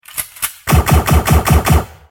Lazor Cannon

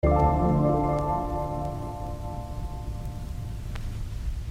Lo-Fi Sparkles

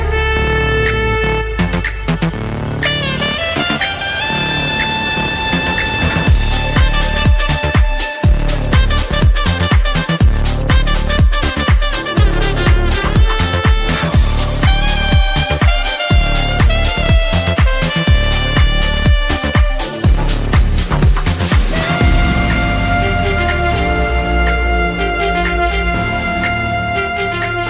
Trumpet

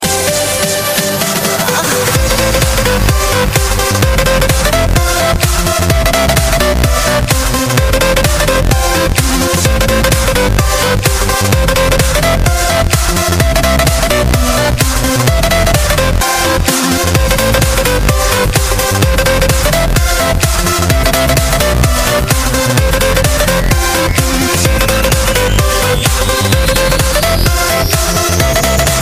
Trance

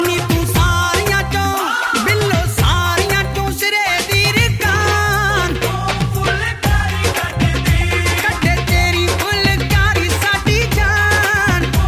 Punjabi

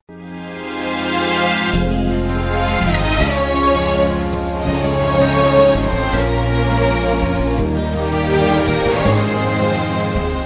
Oriental

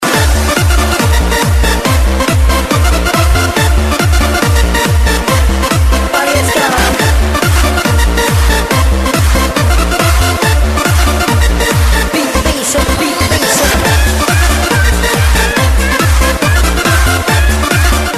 Dance

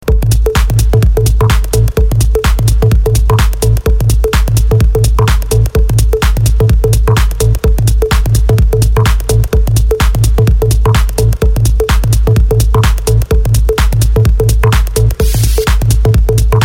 Stereo Bass

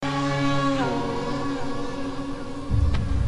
Force Message

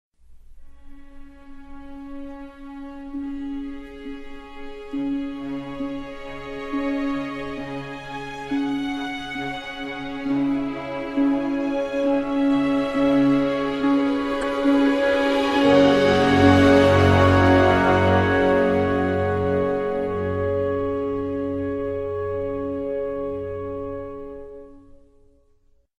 transition; Apartment

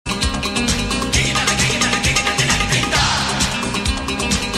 Tabla

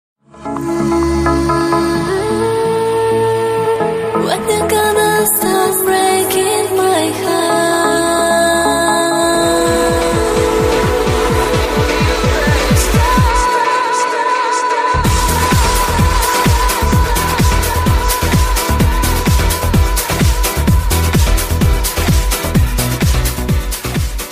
love music

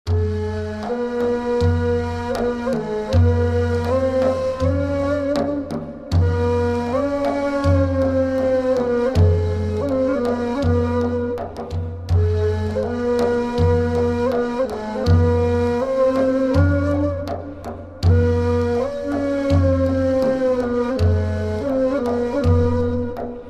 Sufi Music

